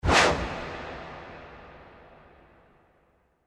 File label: wipe; Страшные звуки для монтажа